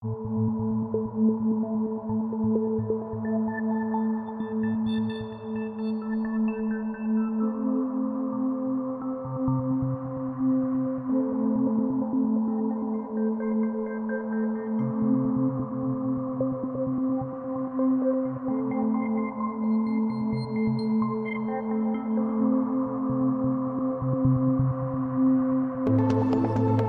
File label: Drone